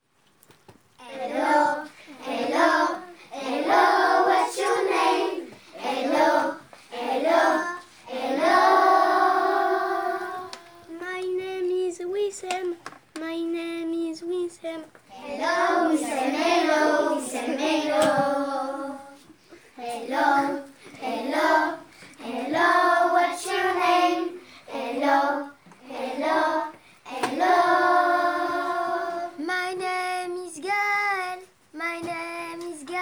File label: Vocal